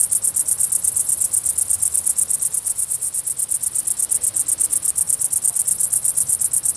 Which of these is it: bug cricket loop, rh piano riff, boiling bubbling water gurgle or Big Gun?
bug cricket loop